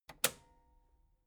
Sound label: metal button cassette stop push